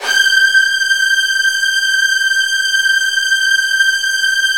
G4, Virtuoso Strings; ILIO; Kirk Hunter Virtuoso Strings VOL-1

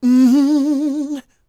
MMM, Vocal Blues Soul; Spectrasonics; Planet

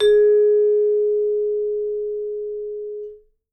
G#4, Percussion & Harp; Miroslav Vitous

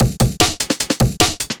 150 mixed; amen; M8; percussion and foley

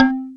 native perc; Greytsounds; Scott Peers Sound Engineering

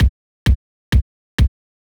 130 kick loop, 4 on the floor loop; Kicks

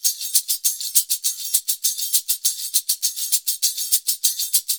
Modern Percussion Loops; Soundscan vol.56; AKAI

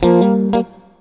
STRAT GT

